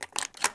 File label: jackhammer insert shell; weapons